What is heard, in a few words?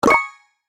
Flash sale pop up; black friday